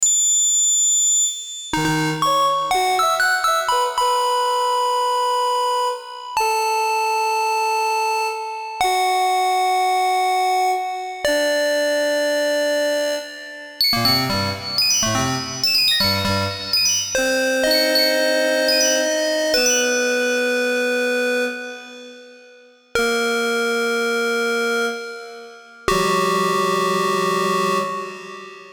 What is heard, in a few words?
drunk ring the alarms